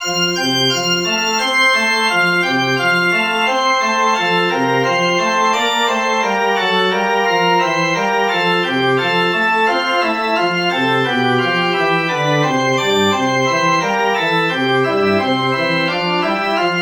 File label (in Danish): forspil